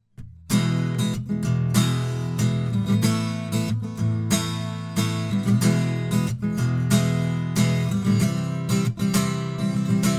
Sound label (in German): Akustik Gitarre